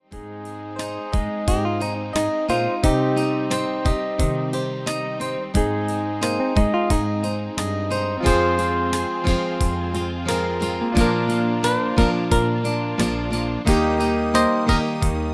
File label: MIDI